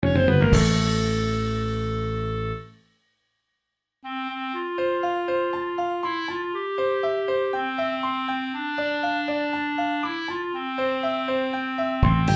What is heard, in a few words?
gameover